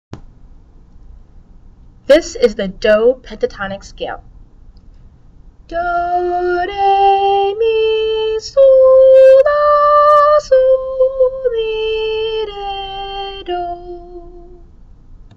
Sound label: do pentatonic scale